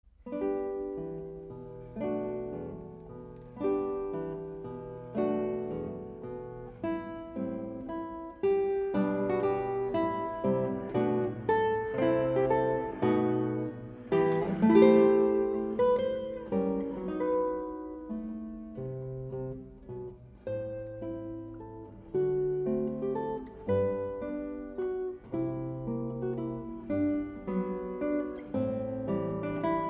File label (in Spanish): guitarra